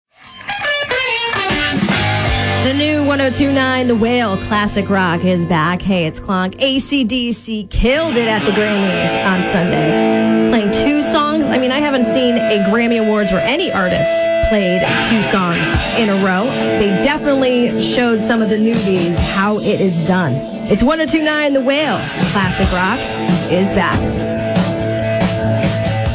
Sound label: klonk